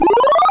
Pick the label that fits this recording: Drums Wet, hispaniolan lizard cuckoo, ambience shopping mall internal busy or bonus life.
bonus life